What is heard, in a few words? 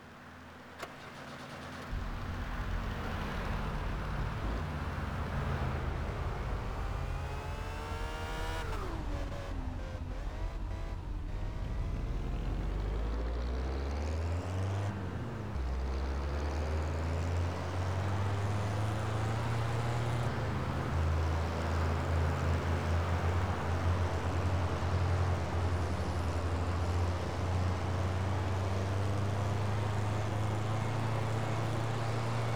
mercedes-benz 300 sl gullwing; TDU-1